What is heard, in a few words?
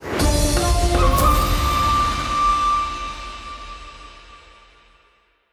super mega win end; amazon-city-of-gold